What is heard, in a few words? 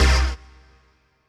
HOUSE, House Dance